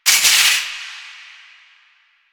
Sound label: Shopping Cart Hit; Warframe; Nineteen Ninety Nine